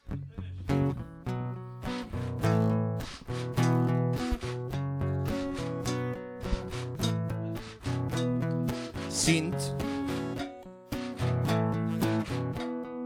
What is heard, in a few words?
Synth is dead